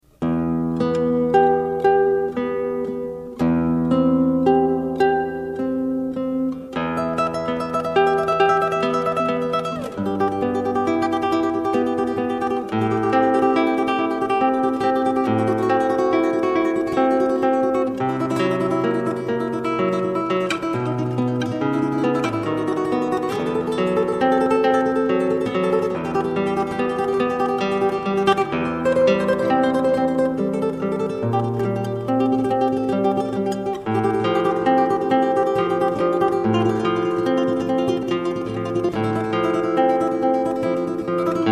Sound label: Grand Tremolo